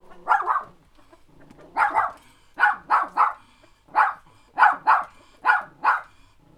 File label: barking dog